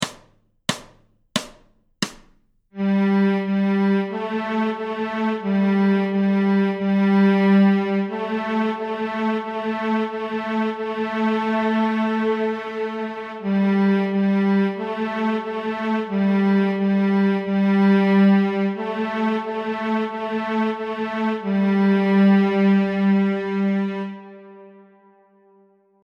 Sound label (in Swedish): Första steget Stråk G, Nyckelharpa; Notträning